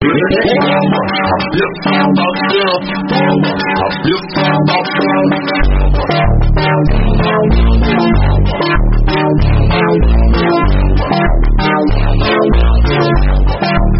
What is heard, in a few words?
phonk